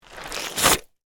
Suction Movement, Texture, Suction, Movement, Mud; Water Sound Effects; Water (Sound Effects:Nature); BlastWave

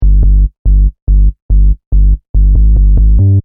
Techno-Trance Sub Bass Loop In G 142 BPM

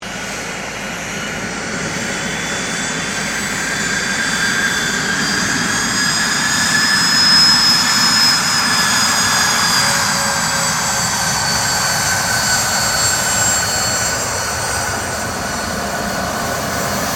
AIRCRAFT CESSNA CITATION CJ3 TAXI; Airplanes; Vehicles

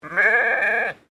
Animal Sheep Unknown Baa; Sheep at Collingwood Children's Farm

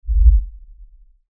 Lfe Swell Low Bass Movement; Swells; Blastwave

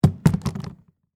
Foley, Suitcase, Set, Down, Hard, Shell, Antique; Samsonite; BlastWave

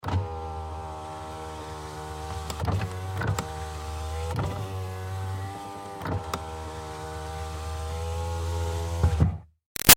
Car Highlander Power Window Up-Down Moves; 2005 Toyota Highlander V6; Cars; Vehicles; Big Room Sound

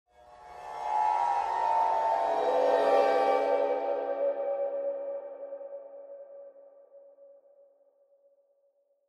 Horror Cymbal Swell Swirl Ghostly; Scary Tones; Blastwave

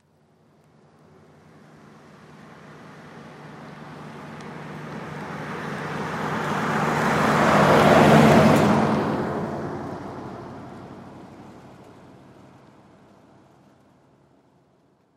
Car Drive By Country Road; Transportation Automobile

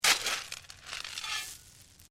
Digging Shovel Stones; Various digging tools and processes; Construction; SoundEffects Foundation2

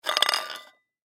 Tin Can Spin, Tin Can Empty Spin Short Cement; Spinning Sound Effects; Objects; Foley

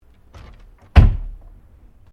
Door Pivot Interior Wooden Louvre Close

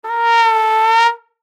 Flugle Horn Swell, Flugelhorn Swell Slide; Musical; Cartoon

